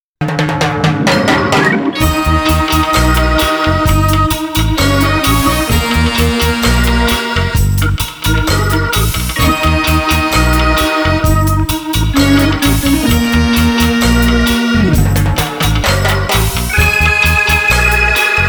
Crazy Reggae; 예능BG

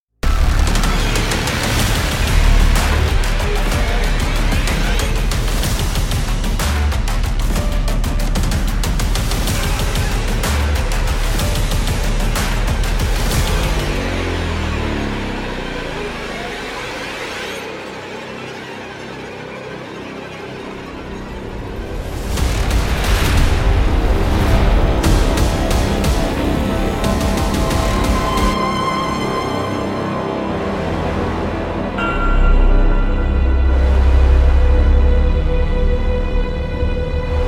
Huge Monster, Tension

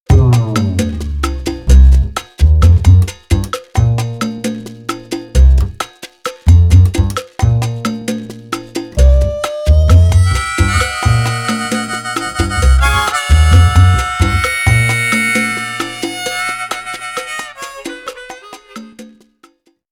Boooong; Comic Slow

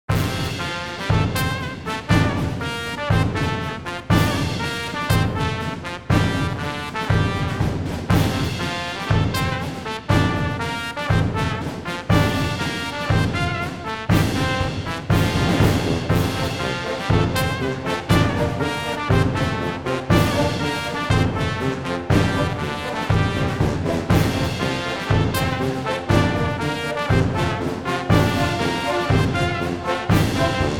Marching Band; 행진

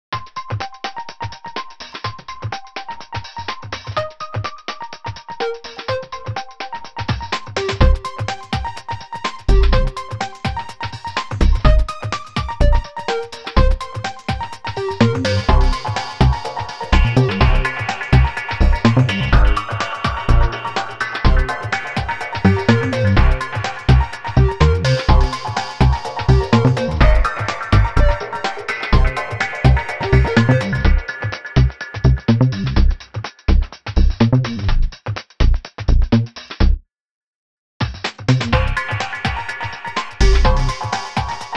Electronic, EDM; 리듬

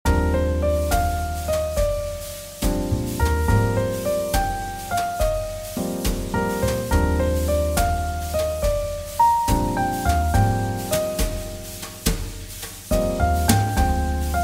JazzBallad; 잔잔

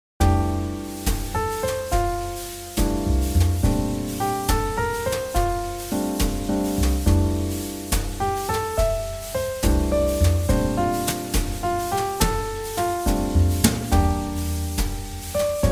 JazzBallad; 잔잔

